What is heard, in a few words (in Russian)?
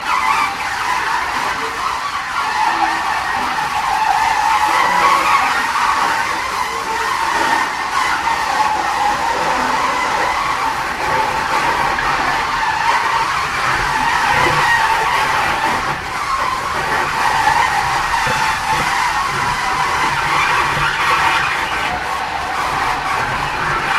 zvuki bagazhnoi karuseli v aeroportu